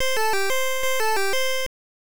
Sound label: Checkpoint; Zippy Race; nes